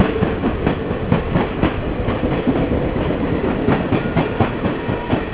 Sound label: train loop